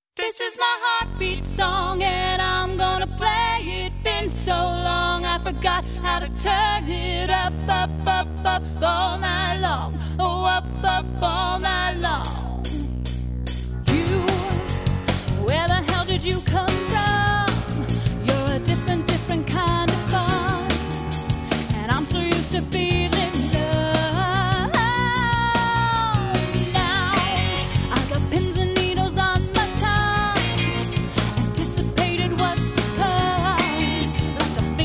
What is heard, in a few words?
Heartbeat